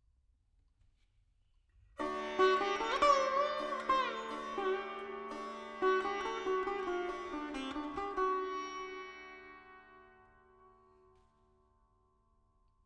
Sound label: Veena D 70 Verb; Sample Banks; Melody; 4-4